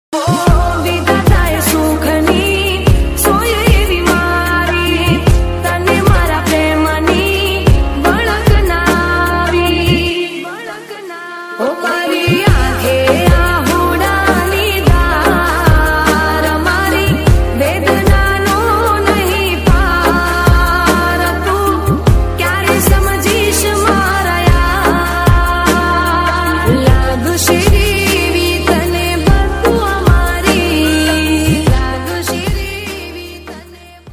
Gujarati Song Ringtone; ringtones

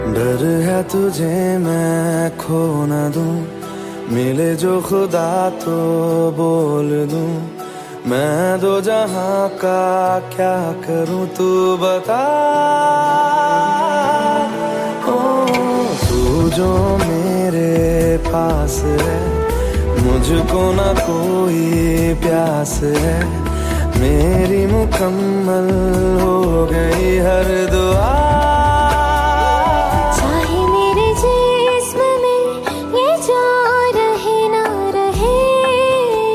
Hindi Love Song Ringtone

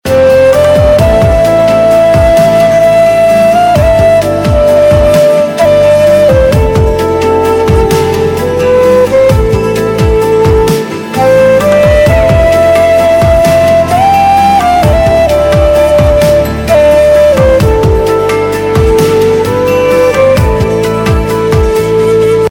Romantic Flute Ringtone

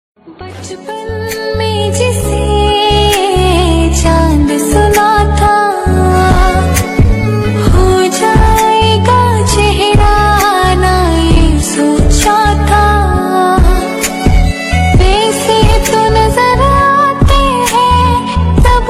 Sad Song Ringtone

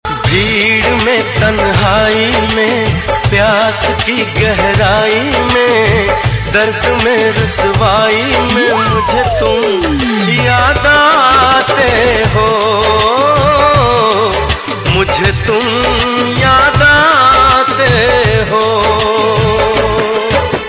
Hindi Romantic song

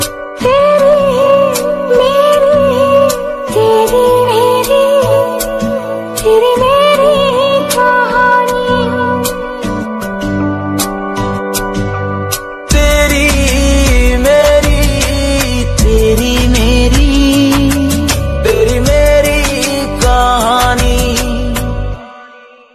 New Hindi Song Ringtone

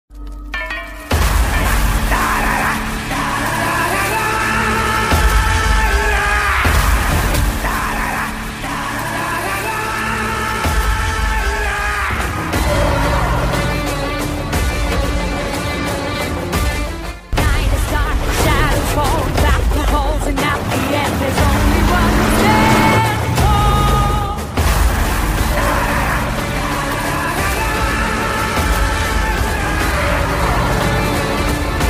Movie Bgm; ringtones; Kaithi

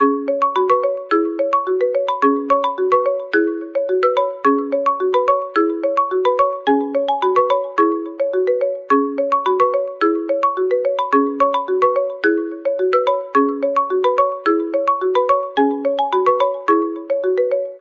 iPhone 12 Marimba Ringtone; ringtones

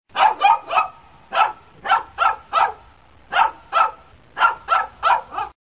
Little Dog

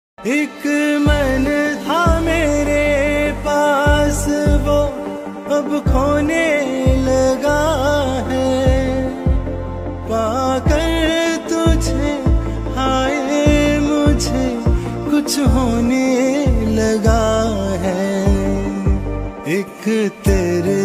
old Hindi song Ringtone

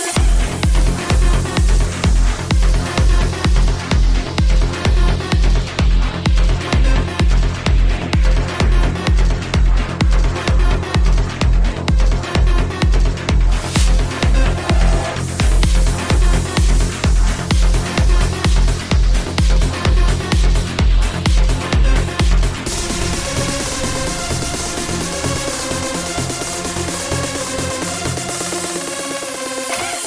Rock

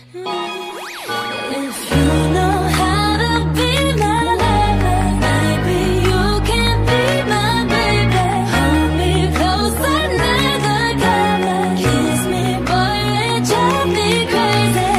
English

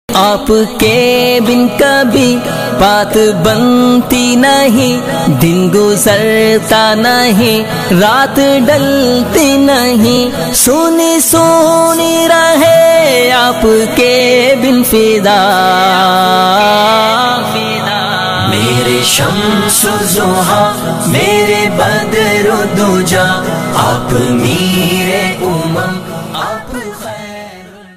Naat Ringtone

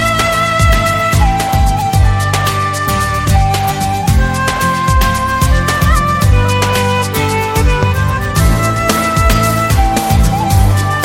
Dashing Music Ringtone; ringtones

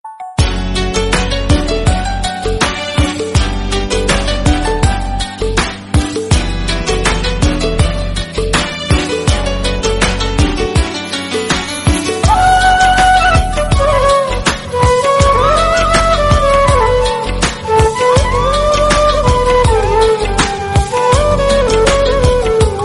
Bgm Ringtone For Girls

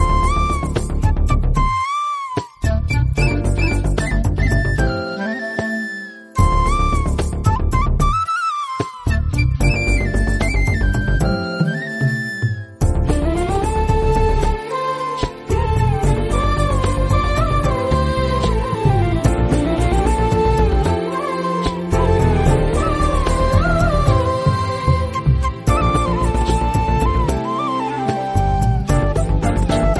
Instrumental Ringtone

